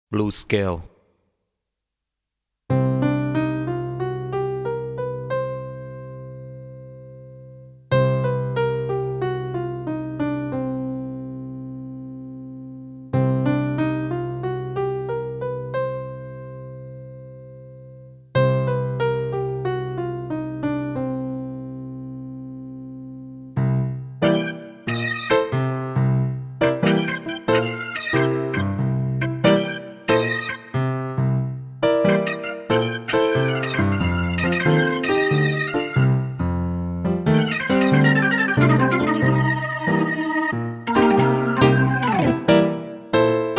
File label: blues